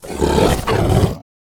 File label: Creature Growl; Game Essentials